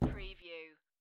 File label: Poker Chips One Chip Dropping On Felt